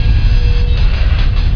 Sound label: flight, plasma